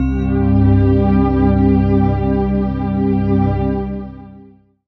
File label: Pad Mood; Jungle Warfare 1&2